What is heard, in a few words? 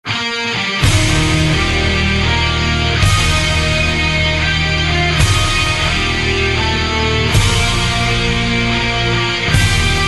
usa national anthem